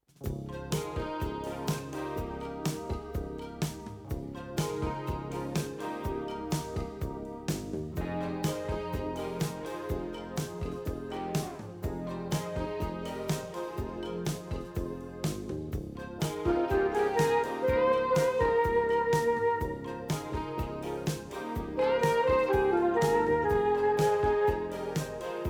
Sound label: Intro Flute